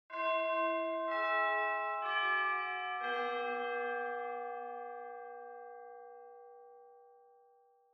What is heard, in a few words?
Japanese School Bell Far Slow Short